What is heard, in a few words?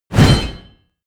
blade whoosh large